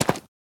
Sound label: move